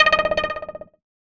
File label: alert, notifications; Android